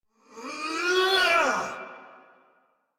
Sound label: Attack Effort; Warframe; Dax Ashigaru; en